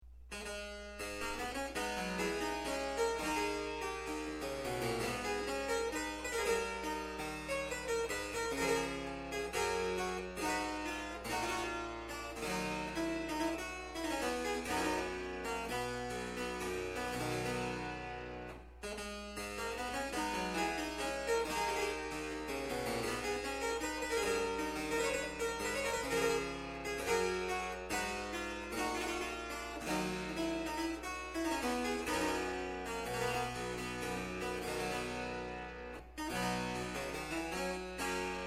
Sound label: froberger courante